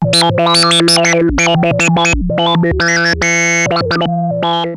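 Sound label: Sample and Hold Filter, SFX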